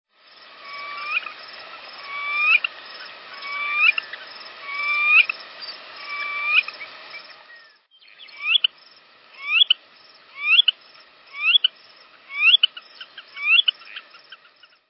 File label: Eared Grebe; Birds